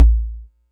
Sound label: digital attacked, Kickbank; Ableton Foundation FreePacks